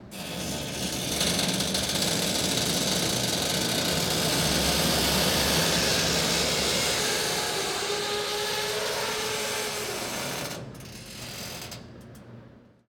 Creepy Door, Slow Creak

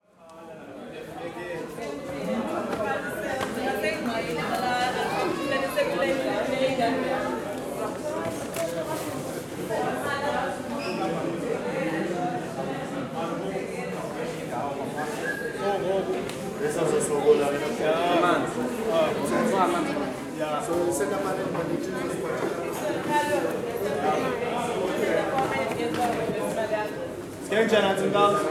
South African Ambiences Interior; TheWorkRoom Audio Post

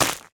footstep ice crunchy run

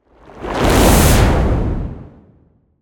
Watery Whoosh Fizzy Fast; Rescopic Sound; Distinct Whooshes

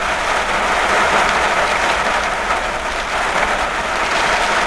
surface rain; hl2

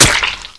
bullsquid spit hit; hl2

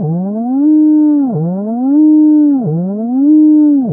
siren; roller; hl2

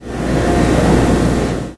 shower hit; hl2

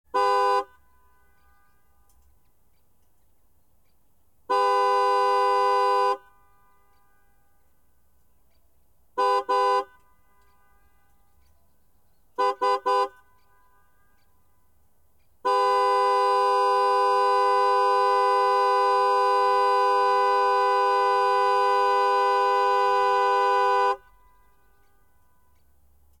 Ferrari 458 Straight Pipes - Horn Various; Wide AB; MKH8060; 2013; Pole Position

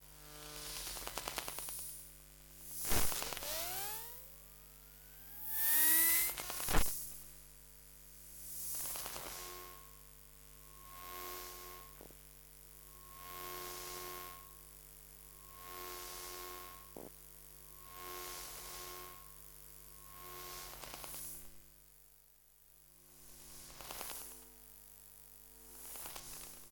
Electromagnetic; InMotionAudio; Electromagnesis Samples Volume 1

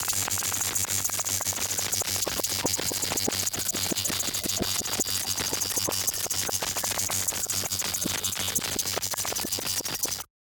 Progress; Rescopic Sound; User Interaction

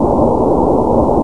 air turret fly loop, vehicles; hl2

